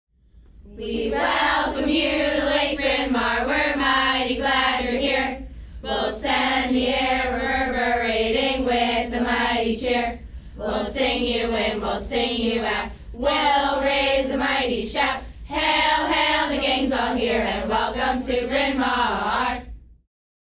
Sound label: cheers